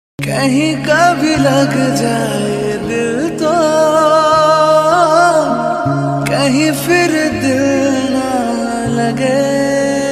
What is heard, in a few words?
Love Song Ringtone